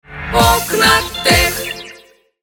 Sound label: jingle